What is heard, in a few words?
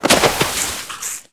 foot snow slide start, footsteps